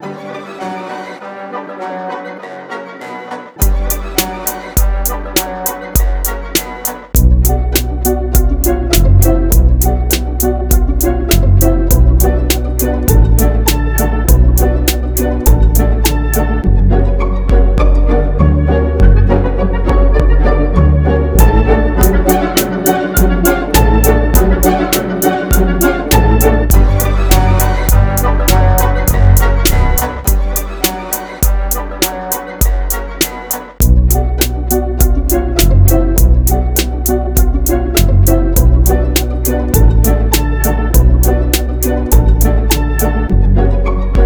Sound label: PIZZ STRINGS